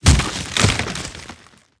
pole laying; World of Tanks Blitz

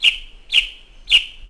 birds; World of Tanks Blitz; ambient milbase

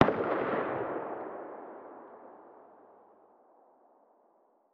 python attack far distant; Rust

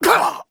hurt medium

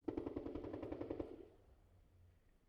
ambient shot mono; War Thunder

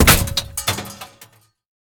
bullet hit inside; War Thunder

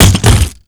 weapon container plastic; Call of Duty Modern Warfare; physics

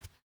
footstep boots cloth jump start; Rust

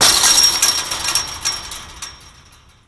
dog fence push

